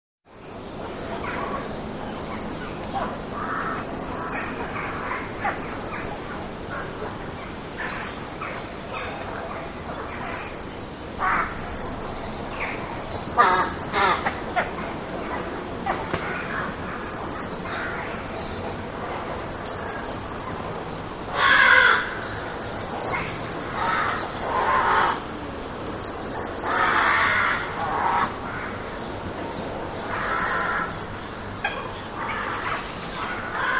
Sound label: Rooks Trees Many Rooks; light